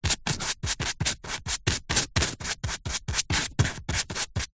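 office paint brush artists dabs brushes on wood palette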